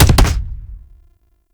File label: Rocket Launcher Punch; Warframe; Tenno; Lasrian